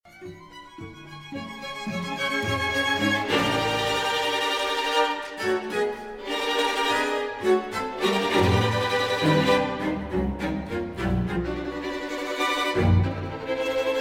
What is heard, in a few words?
Cross for strings